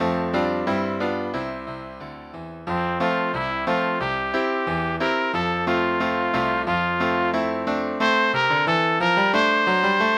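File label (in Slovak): pre trúbku a klavír; Keď som ja jabľčka ráňala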